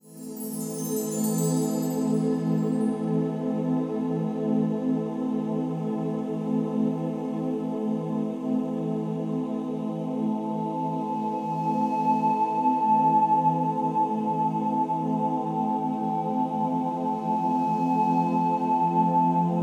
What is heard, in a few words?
Instrumental snippet